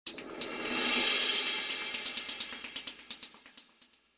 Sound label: West w Perc; TWC Branding Music; TWC 2003